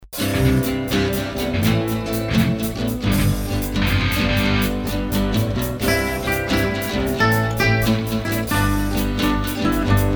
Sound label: acoustic